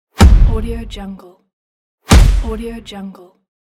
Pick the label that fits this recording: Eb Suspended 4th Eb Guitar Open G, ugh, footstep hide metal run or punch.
punch